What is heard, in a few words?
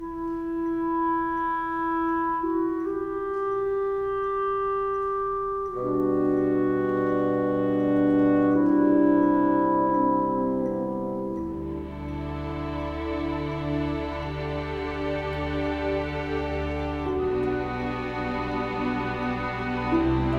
orchestral cue